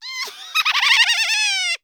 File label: greevil laugh